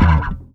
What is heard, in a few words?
E bass fret noise; Sonic Foundry (Sony Creative Software); Ugly Remnants VOL-2; Basstuff